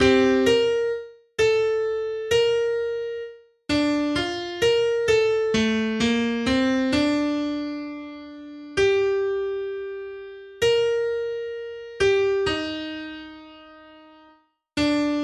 piano sounds

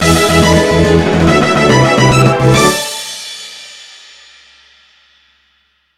Brass of Victory

